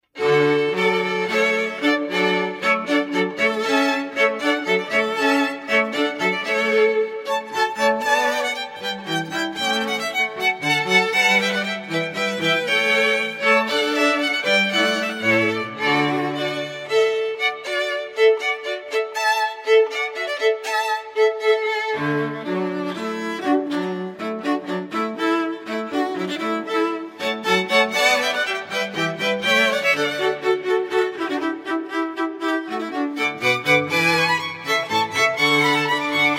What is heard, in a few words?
Hornpipe from Water Music